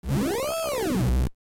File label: sync, synthesizer